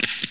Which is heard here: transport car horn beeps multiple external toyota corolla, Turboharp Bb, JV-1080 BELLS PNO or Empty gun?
Empty gun